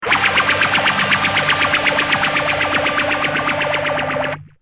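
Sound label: guitar, Yamaha phaser; ph100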